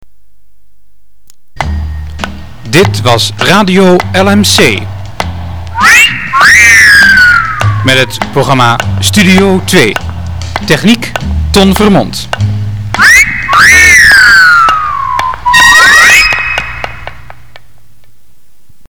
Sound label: jingle; LMC